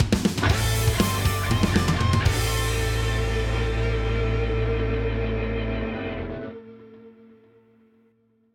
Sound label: Game Over; Capcom Fighting Collection 2; PS4, Switch, Windows, Xbox One